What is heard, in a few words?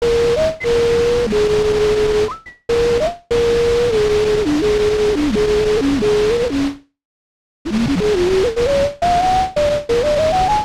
sax breathy; blush